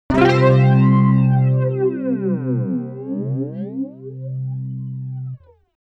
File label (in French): exemple flanger, simulateur ampli; ik multimedia